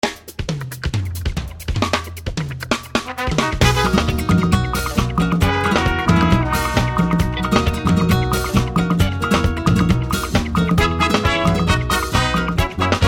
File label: Fruit Salad English